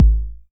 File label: 909 BD; Goldbaby Tape808 & Tape909; The Tape 909